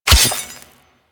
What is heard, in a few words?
Melee Sword Impact Robotic Flesh; Warframe; Weapons; Swords